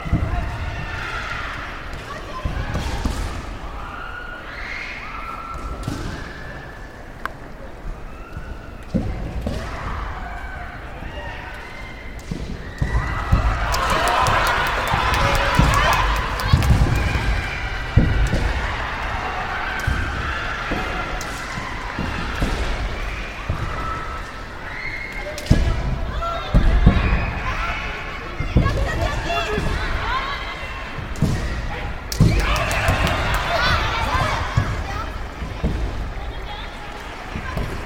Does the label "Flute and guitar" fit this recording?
No